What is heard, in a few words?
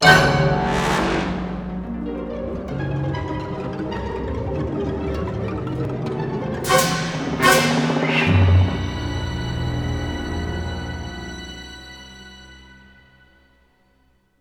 Eerie Event, music; Mood Eerie